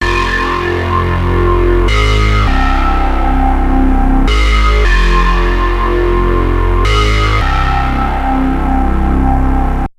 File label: brutal unison; ambika